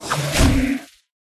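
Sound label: pterodactyl attack; boss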